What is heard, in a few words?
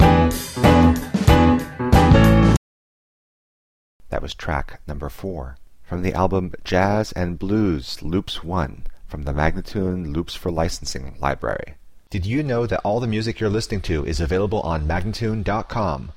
E, spoken, Jazz and Blues Loops; Loops For Licensing; MagnaLoops